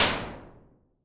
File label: impulse response